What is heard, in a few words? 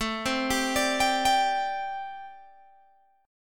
A Minor 11th A Guitar Open G